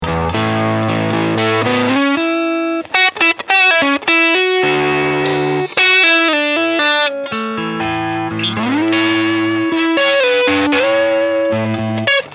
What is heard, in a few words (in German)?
Fender 62 Princeton mit Booster